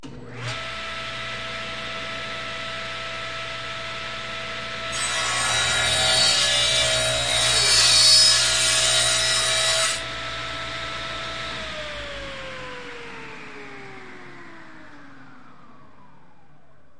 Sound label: table saw; Sound FX Real Life